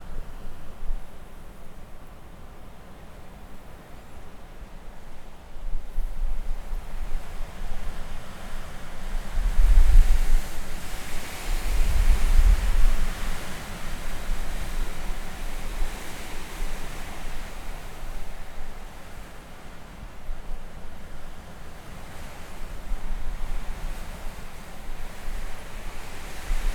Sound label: Prairie Winter Wind Ambient Natural Recording